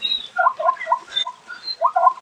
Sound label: zebra